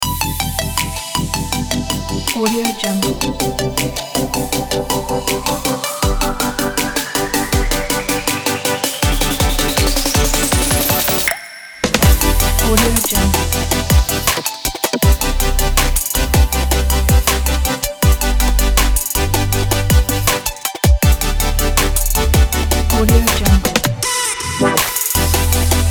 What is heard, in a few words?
future bass inspirational kit